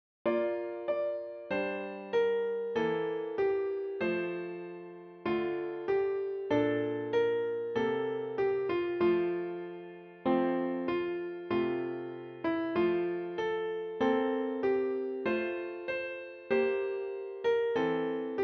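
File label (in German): Klavier; Hark The herald angels sing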